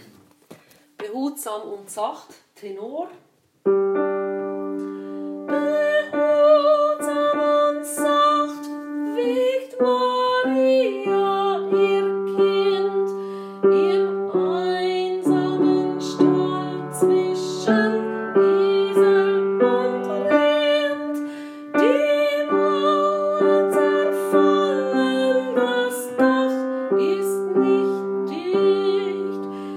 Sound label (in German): Tenor